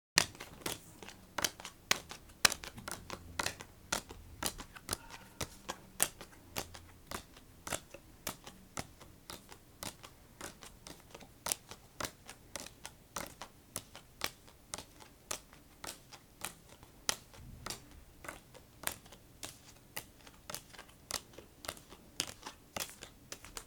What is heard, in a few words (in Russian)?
zvuk kazdachi igralnih kart dealing cards